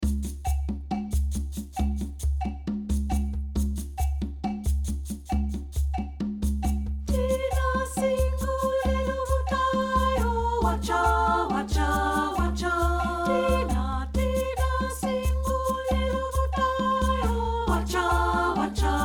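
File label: soprano; THINA SINGU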